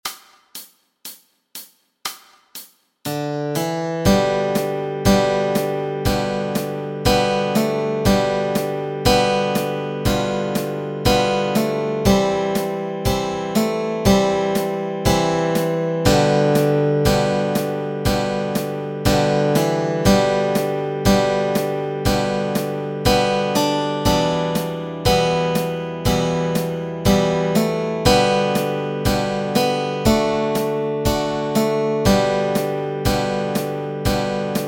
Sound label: Will the Circle Be Unbroken melody and chords